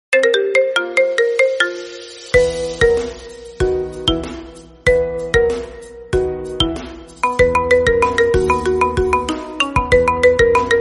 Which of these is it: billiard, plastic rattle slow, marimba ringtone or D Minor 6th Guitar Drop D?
marimba ringtone